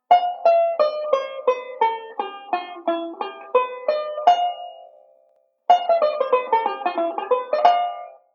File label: Modal Sequence; Beyond Chord Melody Volume II